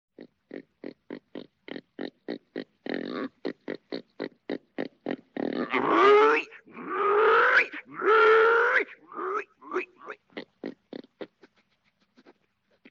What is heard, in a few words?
pig; Animals